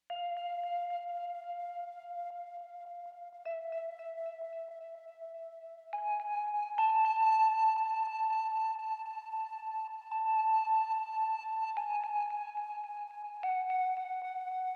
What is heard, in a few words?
vib